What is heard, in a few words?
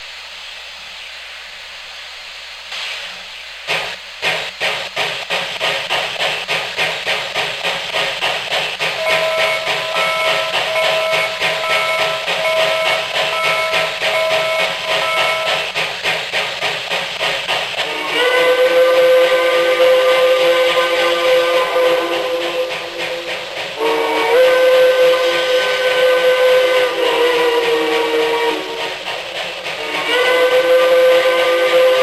Revo Steam